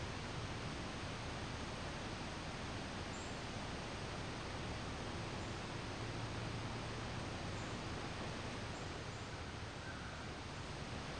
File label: tree sway wind